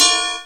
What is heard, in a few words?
RIDE, Kawai R-100 Drums